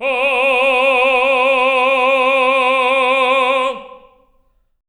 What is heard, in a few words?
TENOR F C4; Spectrasonics; Symphony of Voices